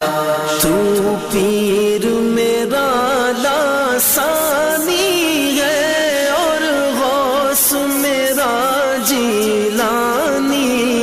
islamic ringtone

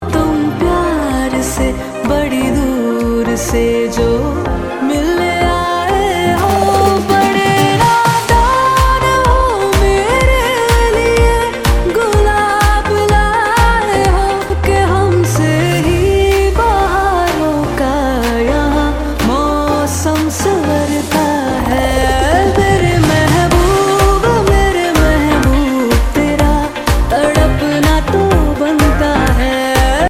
hindi ringtone

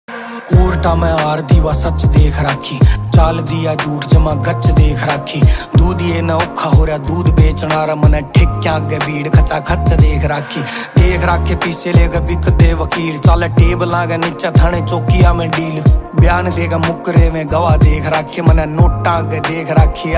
haryanvi ringtone

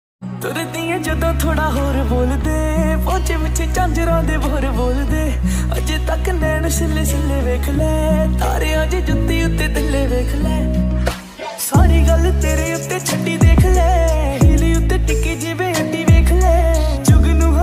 panjabi ringtone

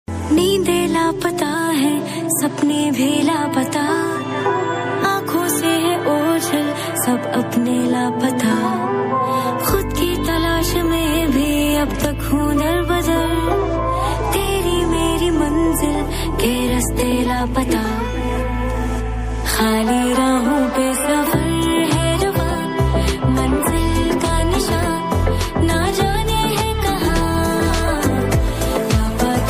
Pakistani ringtone

